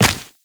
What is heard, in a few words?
Run Dirt, Footsteps; Warframe; Tenno